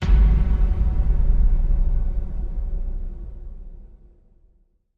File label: Hit Low Bubble